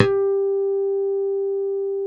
G3 HARM, STIK BASS; D-STIK BASS1

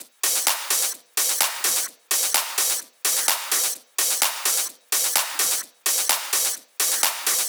Loops 128BPM, Drumloops

